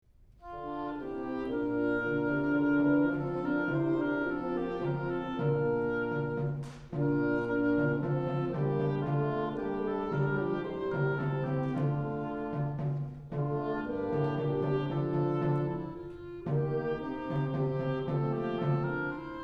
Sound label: courtly airs and dances ii basse danse